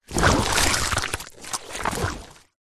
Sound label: cartoon slime big squelch; zapsplat